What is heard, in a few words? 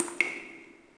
DRIP; DAoC; Toa; Gamestar